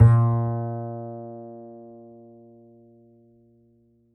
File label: Upright Acoustic Bass; 1ST POSITION; Larry Seyer